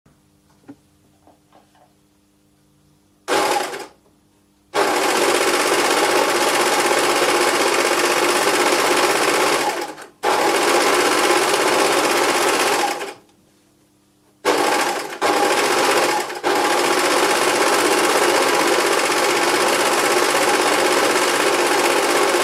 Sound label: Home Sewing Machine